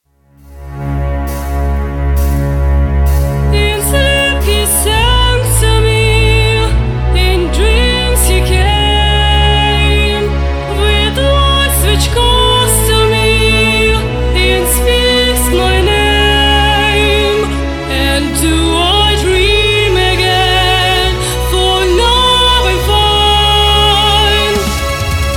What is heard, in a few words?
tuning vocala